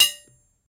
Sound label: Bullet Shell Drop On, shell casings fall